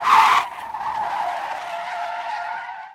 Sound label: police skid; Blind Drive